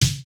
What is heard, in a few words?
no effect j city pop 1980 snare